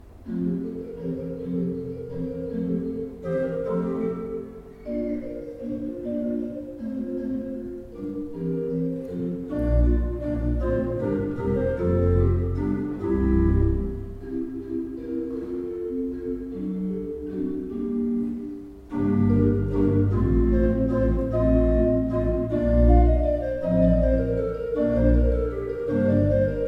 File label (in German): Bosch Raupach, gebrauchtorgel